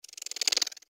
giant rat hiss; npc

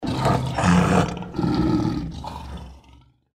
deathclaw chase; npc

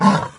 pain, doom3 pinky

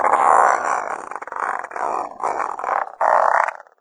chatter, revanent; doom3

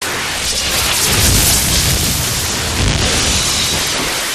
gunstand flamethrower fire flail loop, turret; gow; turret judgement

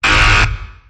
securitybot alarm; gow

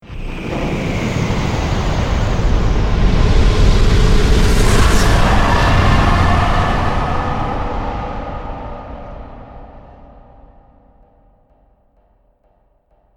boss out

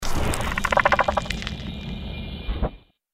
mirelurk idle; magmalurk; npc

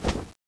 idle, weapons; tfa cso; horse gun

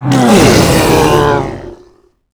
dying; morbus

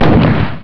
turret

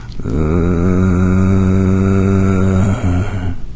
zombie idle; doom3

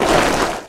drag

